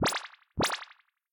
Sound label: Bounce Around; LOOPERS